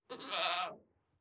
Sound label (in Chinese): 山羊待机声